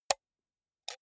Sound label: computer mouse right click slow hard